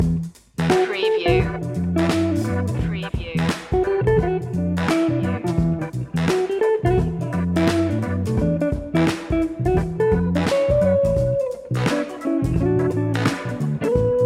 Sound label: cashmere deluxe loop